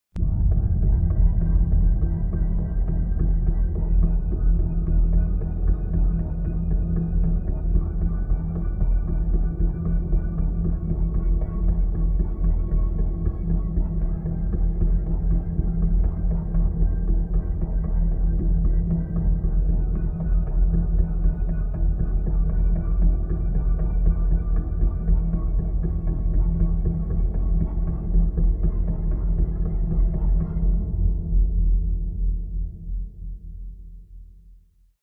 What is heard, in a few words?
Creepier; HydraSynth